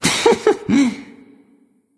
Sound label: hehheh; smite; voicepack; sylvanus